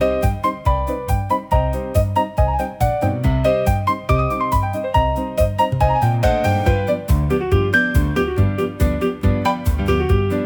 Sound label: gypsy rag